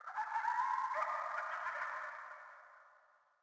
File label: sting coyote; Rust